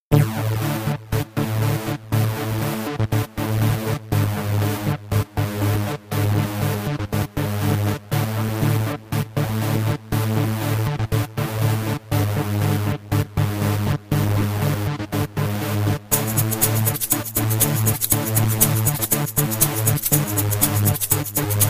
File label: Storm